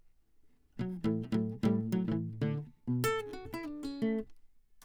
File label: Classic Guitar